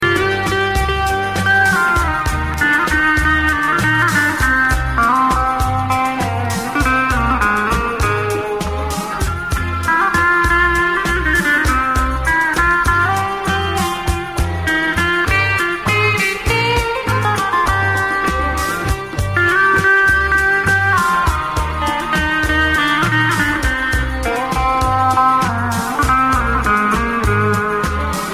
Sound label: Turkish Arabesq